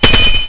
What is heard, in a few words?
katana block; weapons